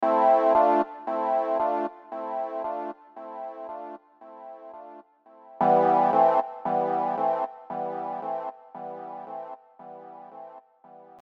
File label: chords